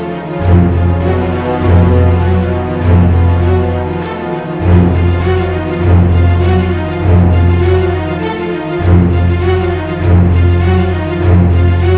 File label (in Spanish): musica; lancelotgames